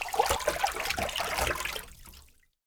water move

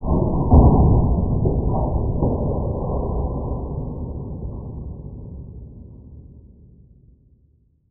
misc amb

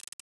357 idle fidget release

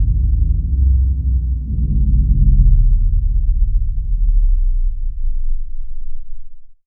ambience energy off

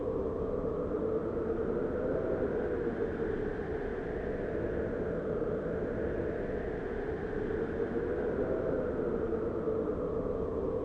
loop wind

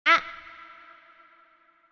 ambience

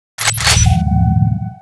level up

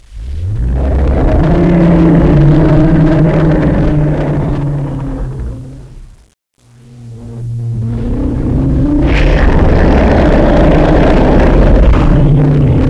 evil ambience left

